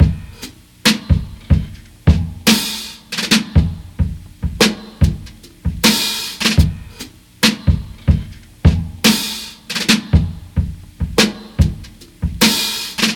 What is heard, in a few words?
73 bpm drum loop sample c sharp key, kick tuned to the C# note